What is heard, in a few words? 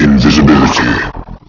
q3 invisibility